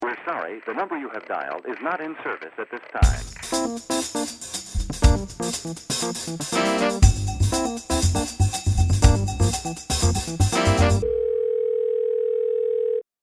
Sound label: Funk Ringtone Super Short